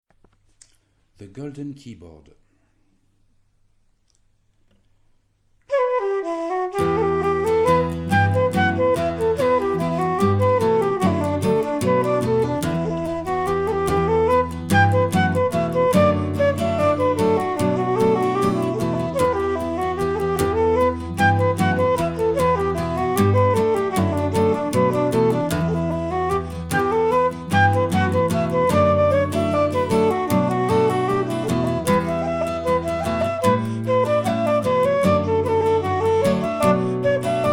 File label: the golden keyboard avec guitare